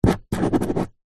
office pen ball point write signature paper on plastic table